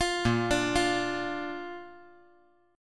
A sharp Major

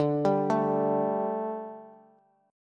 D Suspended 4th D, Bass; arpeggiated

